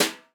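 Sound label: brush medium; Northstar - New Gold VOL-2; 50S BRUSH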